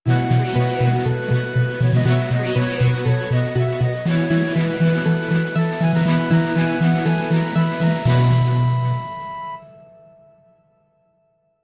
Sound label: News Stinger